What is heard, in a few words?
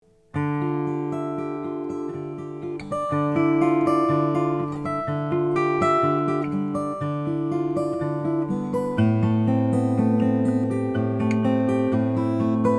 heinonen om african mahogany; t drew